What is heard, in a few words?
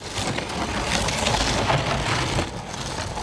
wow loop